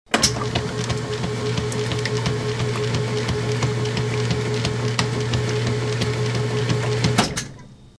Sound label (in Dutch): wasmachine